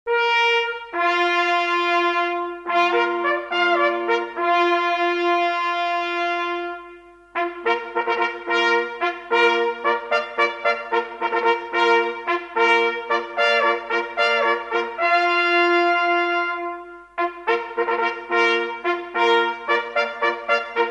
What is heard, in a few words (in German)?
Begrüßung, Jagdhornblasen; Jagdsignale; Leitsignale; Brauchtum